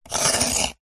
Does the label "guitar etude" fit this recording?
No